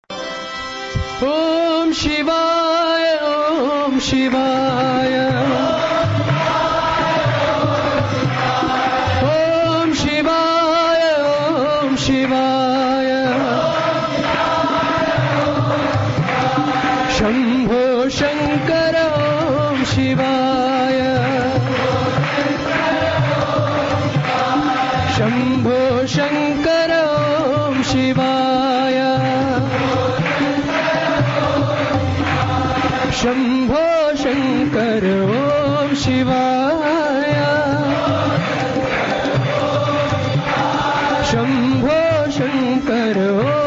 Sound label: Om Shivaya Om Shivaya